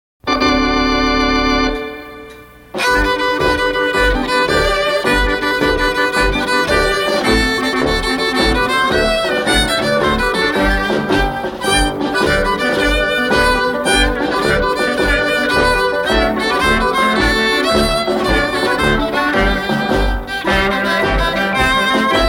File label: instrumental